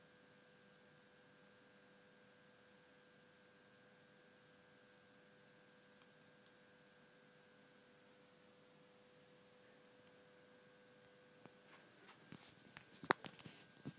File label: Light switch on